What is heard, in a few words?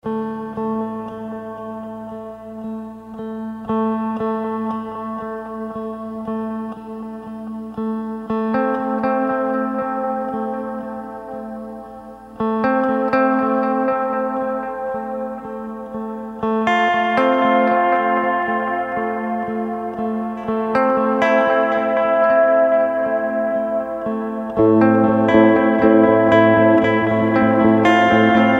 Guitar test